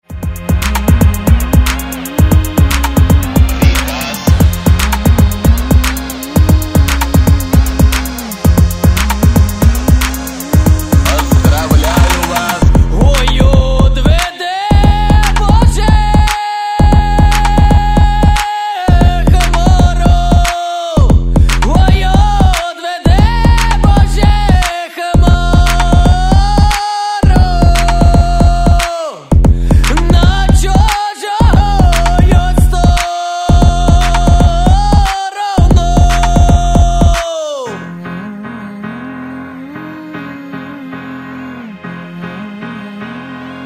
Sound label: Blues